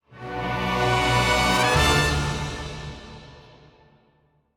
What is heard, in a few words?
Play Stinger